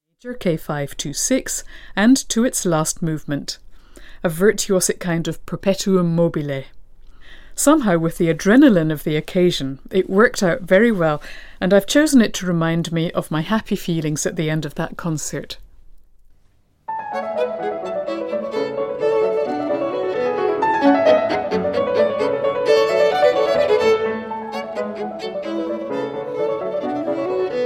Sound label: sonata in a major for violin and keyboard; wolfgang amadeus mozart